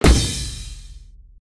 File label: death